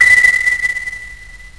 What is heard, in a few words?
sonar; SimStapler